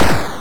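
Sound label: Gunshot; Track'n'Field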